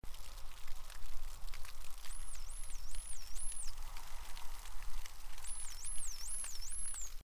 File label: Whistles no boat; dolphin